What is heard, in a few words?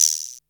XV Perc, Roland XV-5080